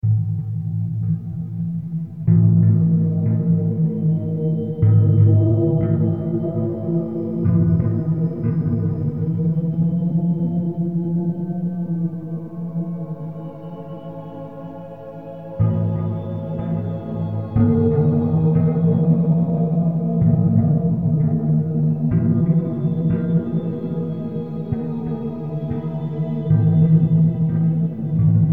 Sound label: horror